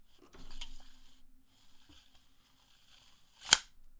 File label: measuring tape rollin in soft; metallic